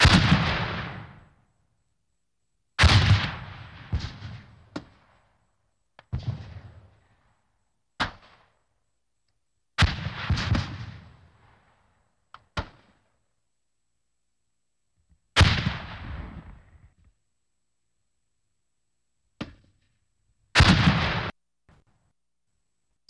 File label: Grenade Launcher, mortars